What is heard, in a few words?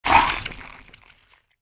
attack